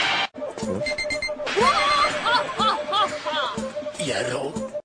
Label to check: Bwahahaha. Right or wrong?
Right